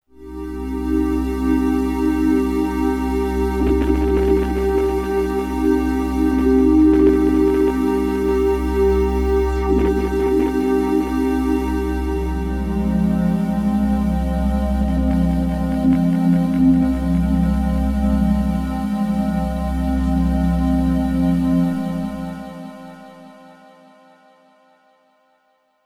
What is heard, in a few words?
Pad korg Z1